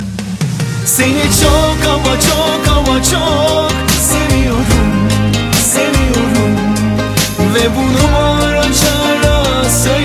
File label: Ringtone